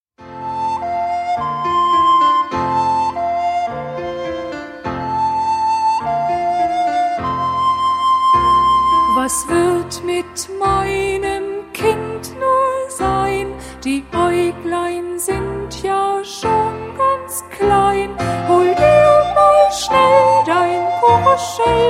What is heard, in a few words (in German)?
Schlafliedchen